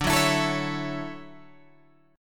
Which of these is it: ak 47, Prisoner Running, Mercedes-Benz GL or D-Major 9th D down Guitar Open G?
D-Major 9th D down Guitar Open G